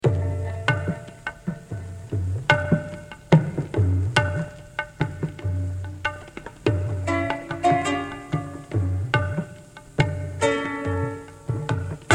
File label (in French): Dialogue Sufi Entre Kanoun Luth Tabla